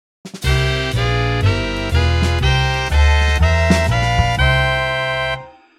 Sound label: Block Saxes